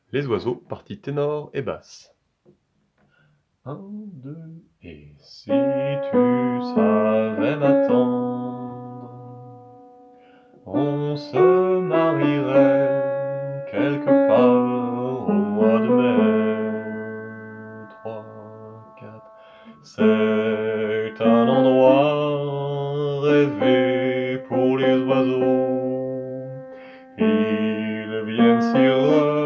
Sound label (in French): Les oiseaux tenor et basse